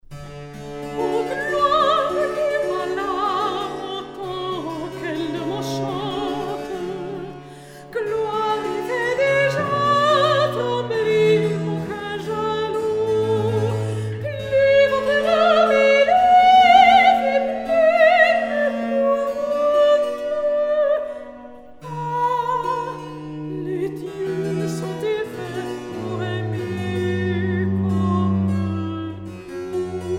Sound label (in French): Recitatif; Jupiter et Europe